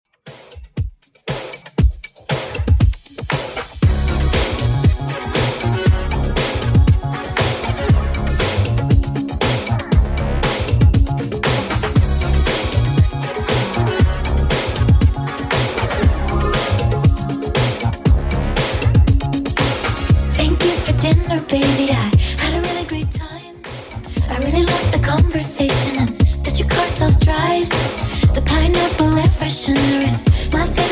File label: Top40 Redrum 118bpm